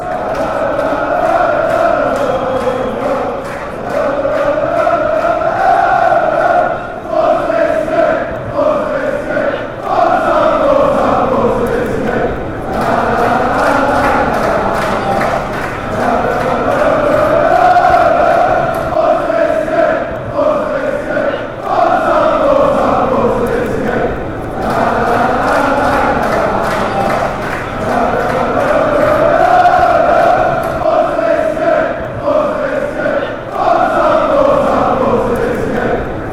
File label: forza krc genk, chants